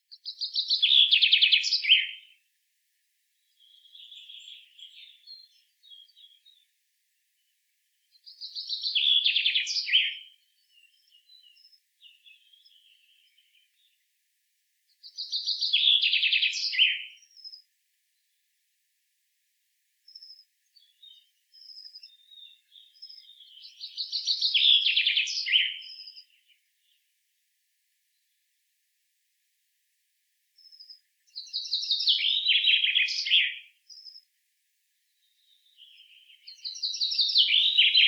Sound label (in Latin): Fringilla coelebs; SETE